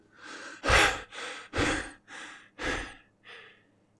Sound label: Male Breathing; Phasmophobia